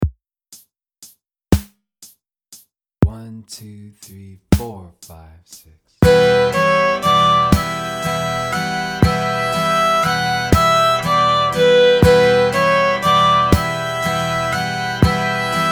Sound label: swallowtail jig